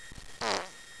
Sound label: fart